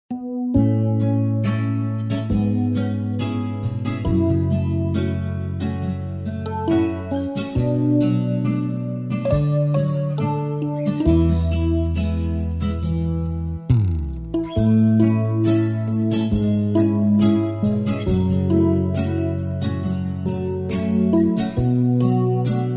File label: sax solo